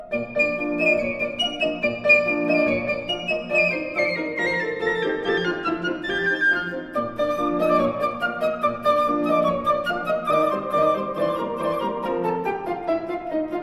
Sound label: piccolo